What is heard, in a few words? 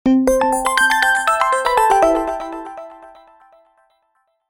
Audio logo